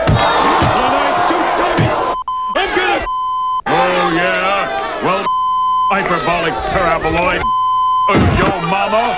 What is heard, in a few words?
beep